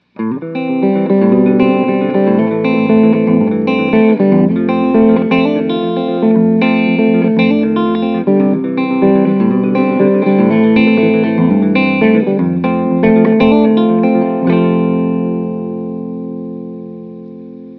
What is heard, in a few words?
Clean